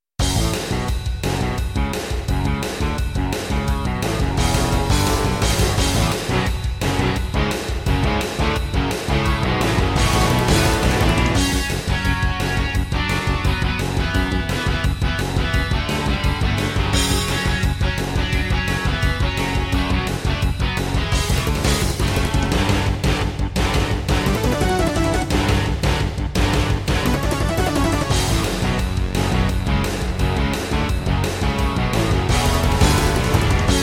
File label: Intro, Roland Edirol SD-20 GS MODE; Duke Nukem 3D